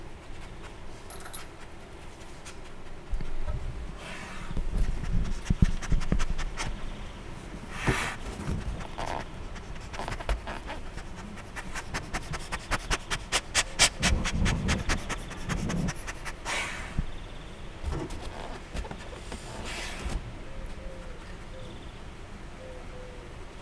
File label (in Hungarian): szimatol nincs más hangja, sörényes hangyász; jaszberenyi zoo 2015